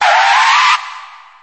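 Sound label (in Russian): короткий звук скрипа шин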